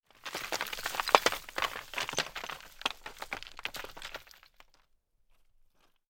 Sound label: Rubble Fall